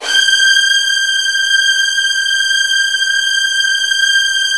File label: G4, Virtuoso Strings; ILIO; Kirk Hunter Virtuoso Strings VOL-1